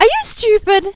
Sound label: death